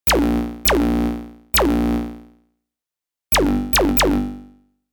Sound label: Osc sync 3 zap